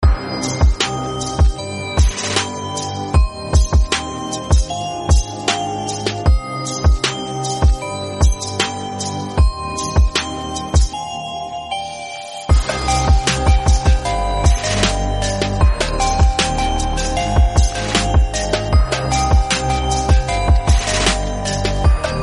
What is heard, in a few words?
BGM